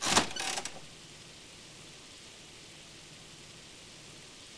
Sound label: quick spin; colossal pinatas